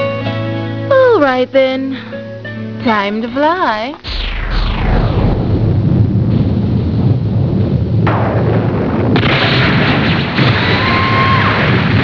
fly; urd; Plus! Themes

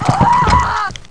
punt; Blood Demo; PC Gamer IT CD 35

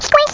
Squench; Mad Bomber!

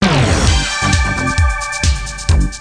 Music Start; Chiral 1.0.1

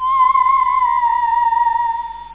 Quiet; JumpingAround

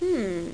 hmm

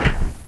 foot clunk; Gunlok

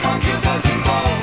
computer

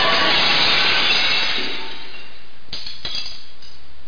GLASS

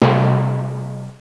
Timpani; Castles - Siege and Conquest

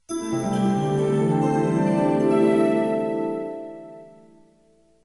Magic Harp; VlogIT

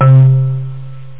Marimba

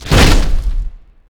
impact troll; Gamestar

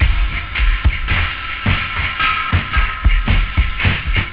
cymbals, house; OctaMED SoundStudio V1

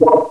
pickup

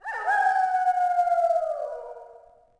animal; DAoC; Toa

